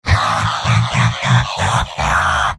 heavy laughter big; mvm

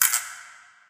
light flicker; Source Engine; Portal 2

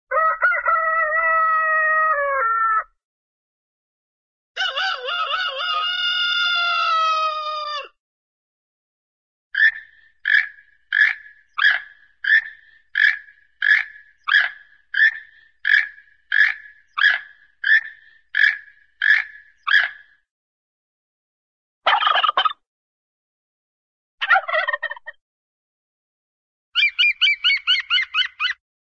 bird, rooster rooster morning call, animal; cartoon

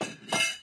dinner plates, ambient materials; Source Engine; video game

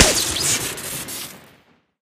syringegun shoot crit; tf

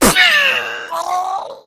death, infected; npc; Left 4 Dead 2; Source Engine

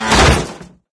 dog tear off van door; ambient; source engine; episodic; intro

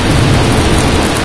flame run; Source Engine; bshift; garg

